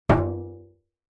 impacts metal thin foil baking tray hit knock bash; zapsplat